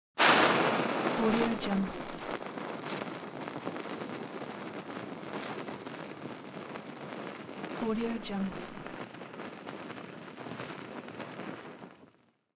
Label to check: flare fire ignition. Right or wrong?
Right